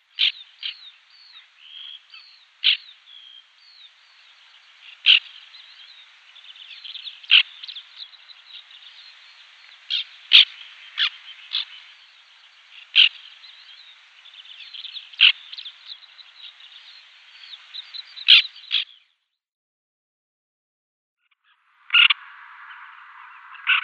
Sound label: White-winged Tern